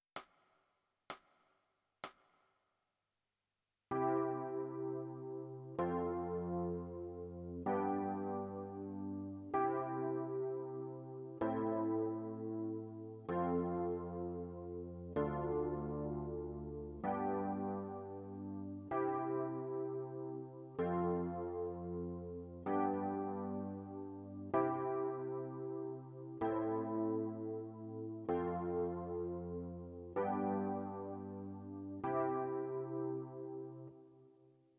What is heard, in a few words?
E Piano